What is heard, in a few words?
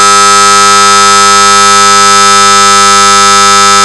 buzzer